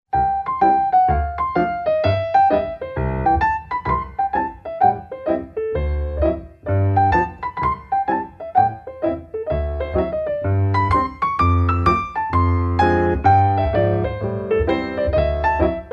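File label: dog bone, ringtone; A3100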